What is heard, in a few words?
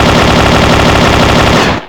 cyclone altfire, weapons